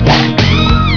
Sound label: whip scream